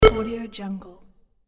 8bit select button; bekibeats

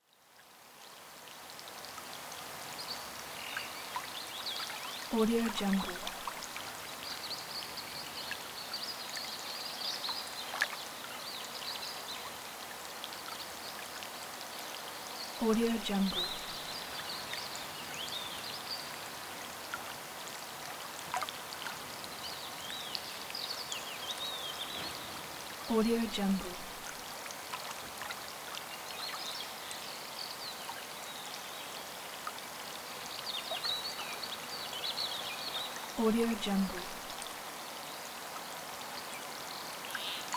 forest stream

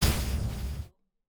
scifi heavy ballistic cannon shot

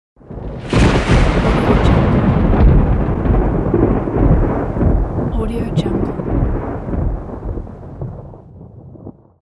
thunder

